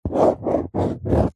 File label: office drawing square pen on paper on cardboard box; zapsplat